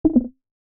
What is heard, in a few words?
error; rolisteam